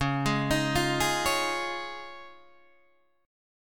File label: C sharp Major Add 9th, Guitar, Open D